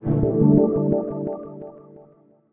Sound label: Startup; Windows Never Released Soundpack 1; deviantart